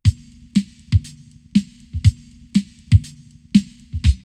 Rare Beat 120, drumloops, Techno&House; X-Static Goldmine

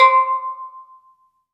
C5, Synth Line

